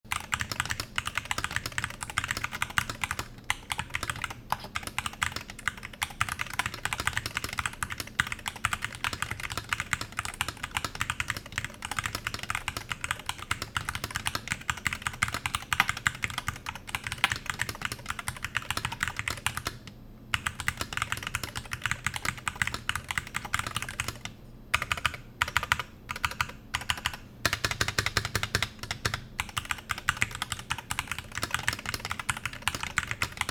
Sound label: made86 ultra, keyboard; melgeek made68 ultra rapid trigger keyboard